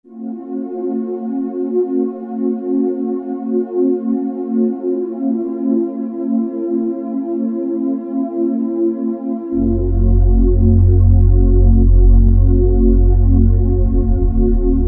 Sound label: Warmer Pad; Roland SR-JV80-11 Techno Collection